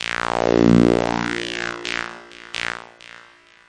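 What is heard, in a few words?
narrowpass synth echohit, 130 bpm; SYNTH AND ELECTRONIC LOOPS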